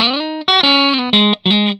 RIFF 120, Vintage Blues Guitar

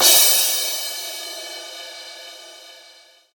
XV Crash, Roland XV-5080

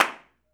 clap, Roland MV8800; REALCLAPS

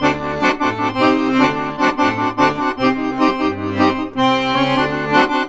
ACC, Balkanish Traditions

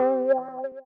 VIBRA WAH, guitar; Spectrasonics; Bizarre Guitar

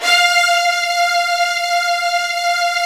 Virtuoso Strings

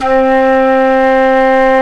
FLT FL AC, C Flutes; Roland LCDP04 Orchestral Winds; 90 s Sample CDs; C Flutes 3-8

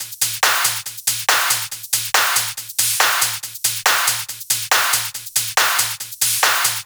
140 Prog Trance Beat; Phalanx; Vengeance - Essential Clubsounds Vol.5; VEC5 Drumloops; VEC5 Multi Loops 140BPM

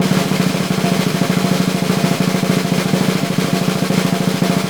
SNR, Synclavier Percussion 2 - World & Orchestral

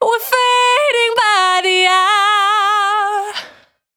Cry 68bpm B, Vocal; Zero-G

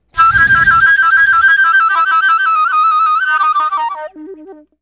FLUTE FX

